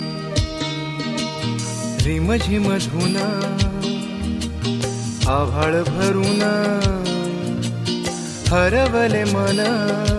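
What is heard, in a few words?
Instrumental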